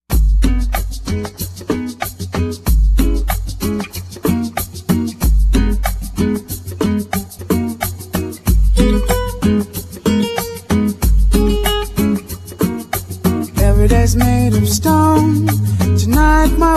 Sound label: groove